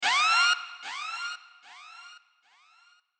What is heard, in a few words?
Siren; Kill Bill